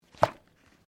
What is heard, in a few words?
Book Page Gloss Flip; Book movement; Books (Sound Effects:Offices)